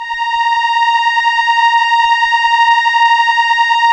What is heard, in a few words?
STR ELKA, Elka Strings, Elka Cellos; Roland LCDP09 Keys of the 60s and 70s 1